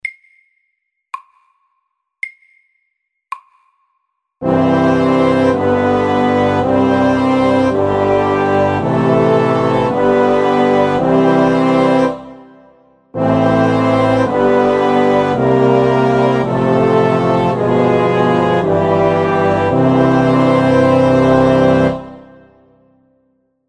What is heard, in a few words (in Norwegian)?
Kromatisk koral SAKTE